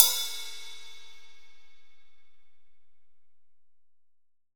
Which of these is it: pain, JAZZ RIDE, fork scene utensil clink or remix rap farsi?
JAZZ RIDE